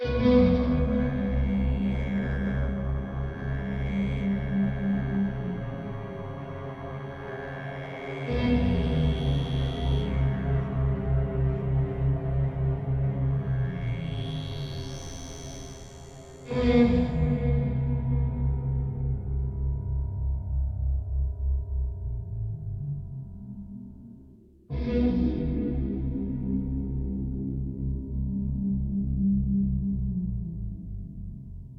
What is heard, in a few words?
Music Stinger Reveal